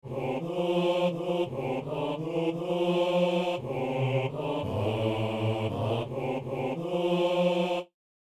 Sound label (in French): hommes; Psaume 70 (71); Messe du 17 janvier à Saint-Denis